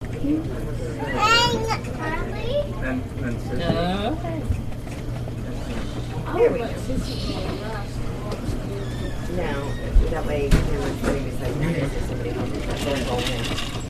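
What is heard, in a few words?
Free Walla Track, Short and Free Walla Track filled with people and kids speaking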